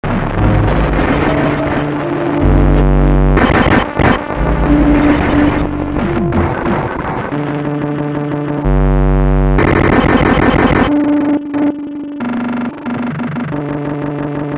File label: Casio SK1, circuitbending; Gijs Gieskes